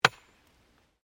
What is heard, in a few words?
Digging Pick Concrete; Construction; Various digging tools and processes